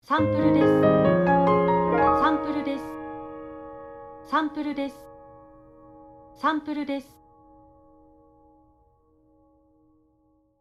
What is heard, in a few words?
Logo Piano fast